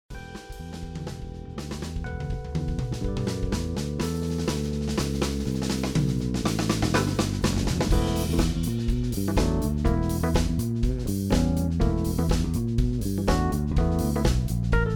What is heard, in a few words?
bgm